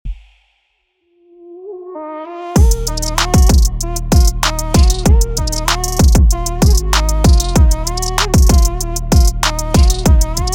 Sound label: beat Bounce